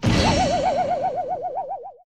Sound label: crash wobble; Crash Bang Boom